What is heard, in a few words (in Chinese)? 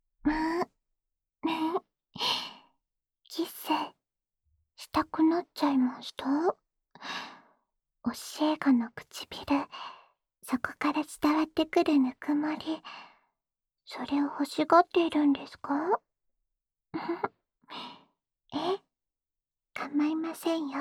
安眠用 温柔地接吻循环